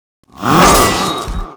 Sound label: WAR ATTACK